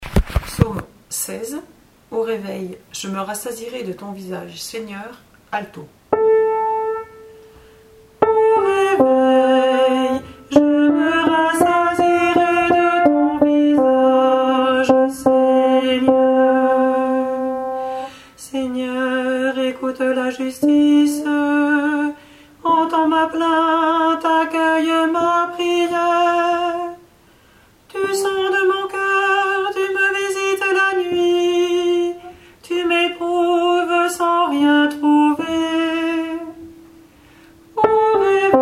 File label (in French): ALTO; Psaume 16 Au réveil je me rassasierai de ton visage Seigneur